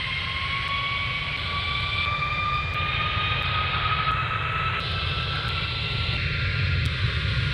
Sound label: airplane